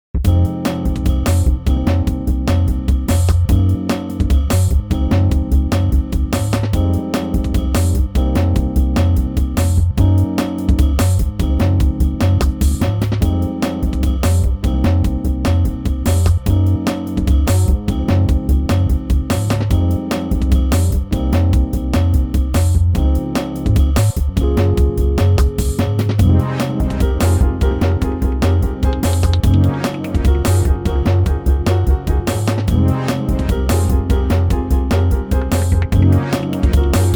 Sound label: jet ambient d and b